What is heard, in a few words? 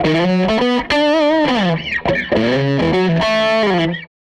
SG Guitar Loop